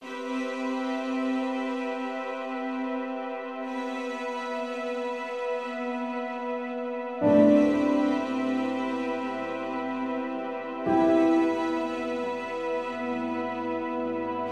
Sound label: BGM; Adventure Player; psp